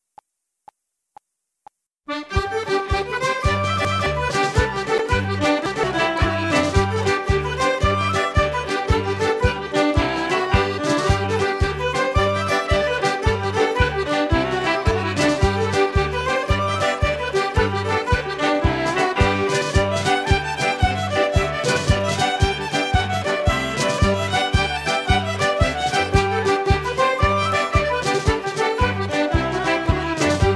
Irish Ceili Set Dance G

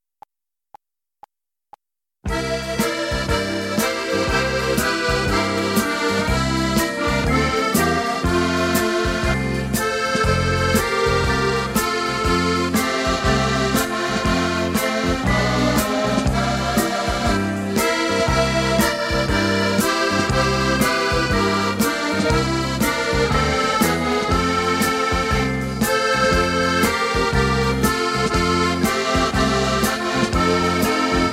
Accordion Medley F; backing track

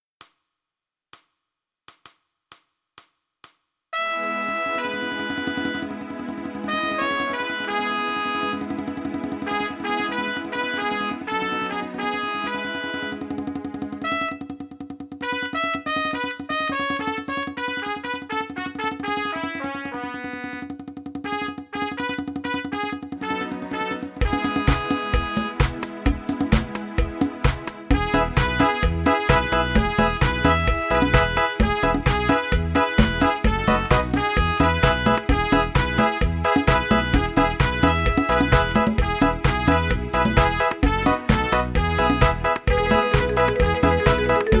Ballroom Medley Clypso, mp3 backing track

